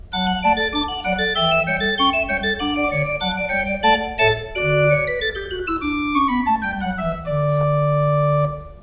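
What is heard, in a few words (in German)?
Grenzing 7 II P, pfeifenorgeln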